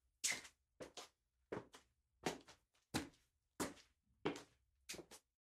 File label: Sticky footprints